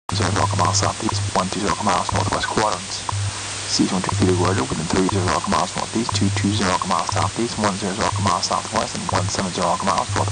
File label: distorted weather